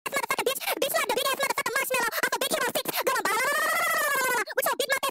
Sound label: going balalalalalalala